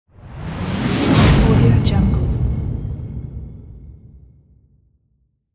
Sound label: whoosh